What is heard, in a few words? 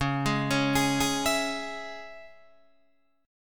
Db Minor Db Guitar Open D